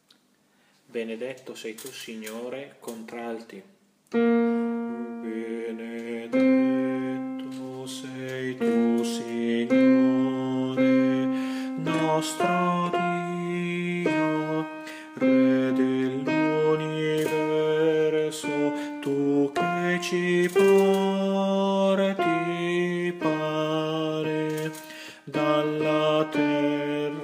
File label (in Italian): Contralti; Benedetto Sei Tu Signore